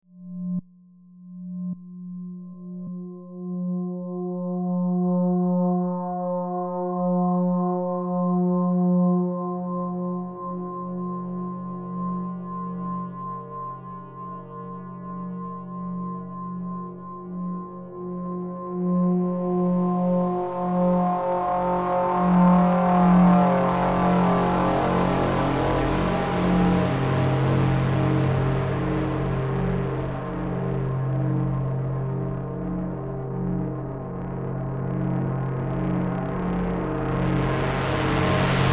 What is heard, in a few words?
fm8 wet; native instruments